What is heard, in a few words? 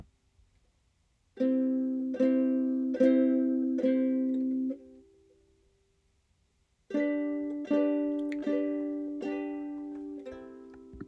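Ukulele chords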